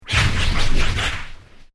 Created Creature Medium Gnash, Alien Sound Effects; Created creatures, vocalisations and pretend speech